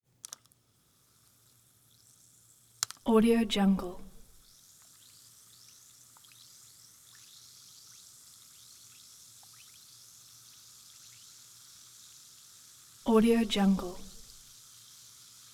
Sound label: alka seltzer; prosoundfx